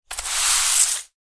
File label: household sweeping leaves broom single; zapsplat